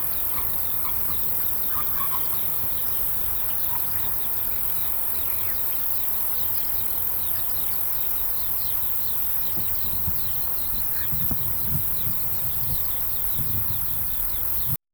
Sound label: a horse is grazing in, meadow